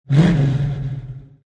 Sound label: Mustang 68 Fastback Rev Exhaust Perspective; Cars; Lynne Music